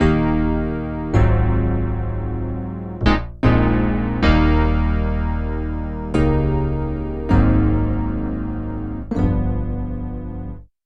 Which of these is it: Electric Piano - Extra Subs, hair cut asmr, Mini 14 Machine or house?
Electric Piano - Extra Subs